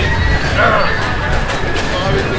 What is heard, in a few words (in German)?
Kneipe; Breakneck; Austral